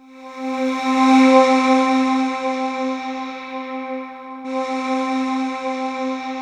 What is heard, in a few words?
SWEEP; 90 s Sample CDs; NiceBeats; SynthLine VOL-2